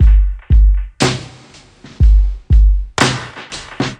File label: Dubby Kit; Electro Dub